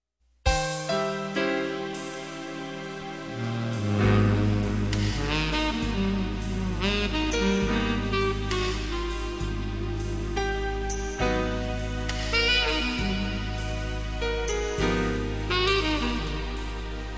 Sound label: sax tenor